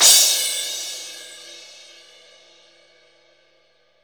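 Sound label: 16 crash, Zildjian; Best Service; Real Mega Drums VOL-1; WHGM KIT 4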